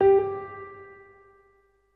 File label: wood spinner